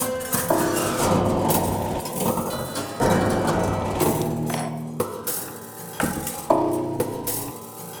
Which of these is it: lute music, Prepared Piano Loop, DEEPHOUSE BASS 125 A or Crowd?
Prepared Piano Loop